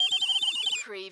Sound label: CARTOON TRILL